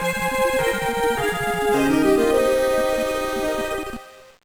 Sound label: Jingle; Ghost Trap; GBA